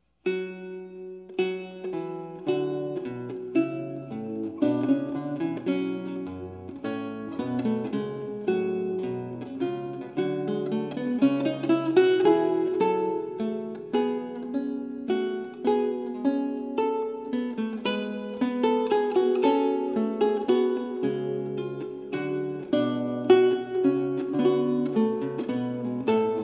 Recercar, on renaissance lute

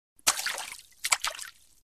Water Puddle Splash; Hollywood Edge Foley Sound Library

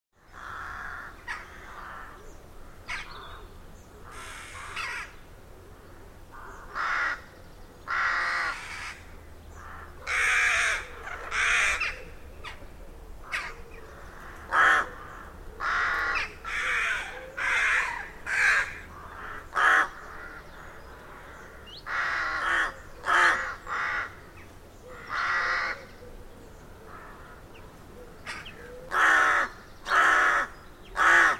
Rookery In Derelict Abbey; Hollywood Edge Historical Series; Traffic Free BG's & FX

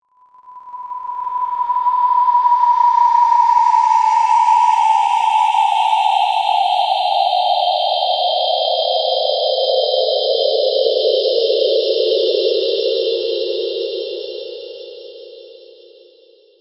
Eerie Fall Noise; Best Of N2O Library; Drones & Foundations

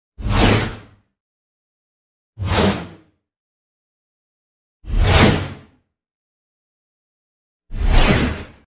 Whoosh - Short flash x4; Hollywood Edge Sound Designers Tool Kit

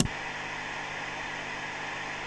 AMP NOISE; 1000fx

